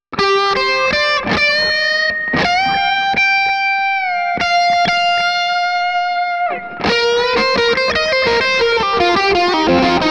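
Distortion, Echo On Delay, chitarra elettrica Kramer; Gain: 4/10; Tone: 4/10; Middle: 10/10; Presence: 4/10; Volume: 4/10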